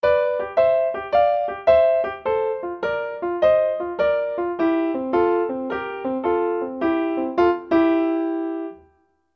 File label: Right hand G F C, piano blues